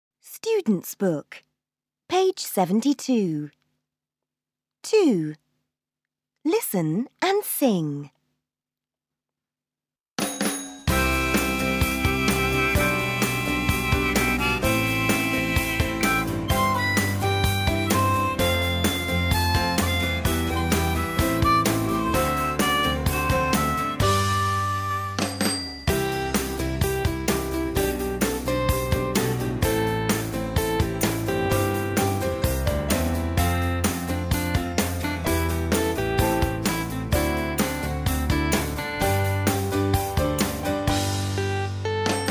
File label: Karaoke; Super Minds Level3